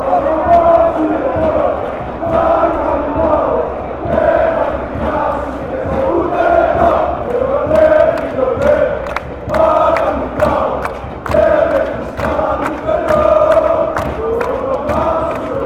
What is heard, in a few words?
olympiakos chant, fanchants